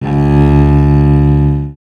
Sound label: Cello